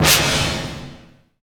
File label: Power Stab; Zero-G Jungle Warfare 1, 2 & 3; 90 s Sample CDs